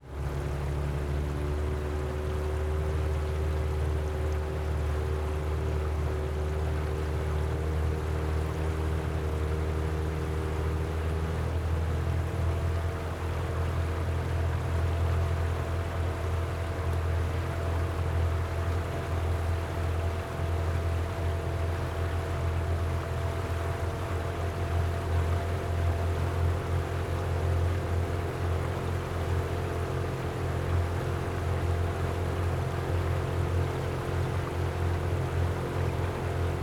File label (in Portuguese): Motor de barco acelera e desacelera para